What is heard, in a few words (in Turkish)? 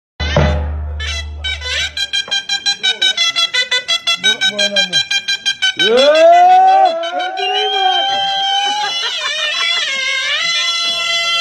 Zurnaci; bu zurnaci efsane